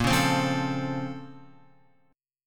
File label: A sharp Minor Major 9th, down, Guitar; A#mM9 strummed; JGuitar